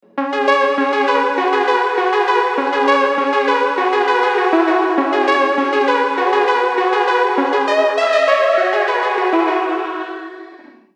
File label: Makeshift C#m 100, Synthwave Synth-Pop MIDI Melody; Unison Synthwave & Synth-Pop MIDI Melody Collection